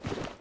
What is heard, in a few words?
open